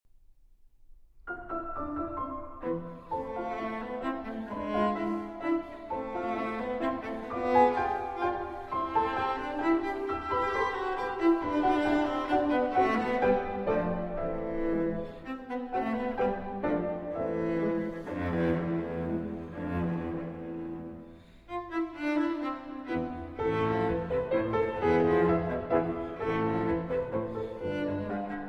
Sonata For Cello and Piano in E Minor, Allegretto Quasi Menuetto